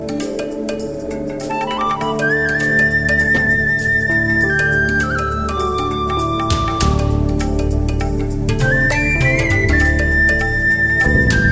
Penny Whistle

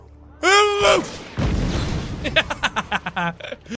Laughing

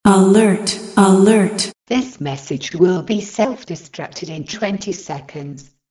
Self Destruct Sms

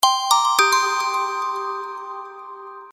Hot Echo

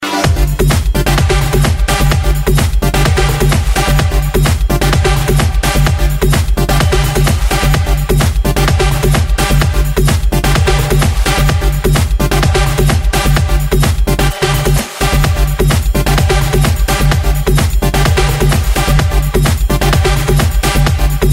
Cool House Ringtone

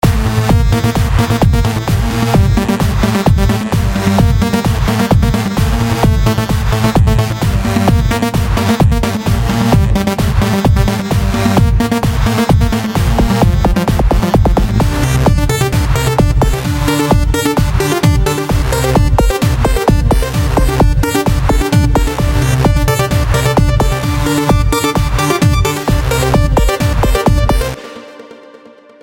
Techno

